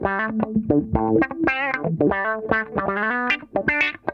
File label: DUCKEY, Funk Guitar; 90 s Sample CDs; Zero G